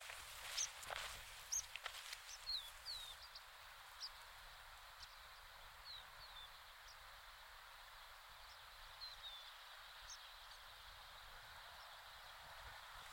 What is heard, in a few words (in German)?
Bergpieper ssp. coutelli, Oman; Sohar-Sun-Farms